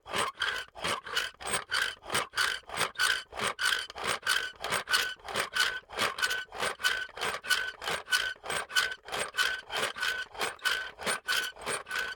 Rotation, Metal, Crank, Gears, Scrape, Squeak, Purposeful, Organic, Loop; Hzandbits; Elegant Machines